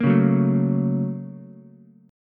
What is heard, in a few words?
Bb Minor Major 7th Sharp 5th Bb down Ukulele; BbmM7#5; strummed; JGuitar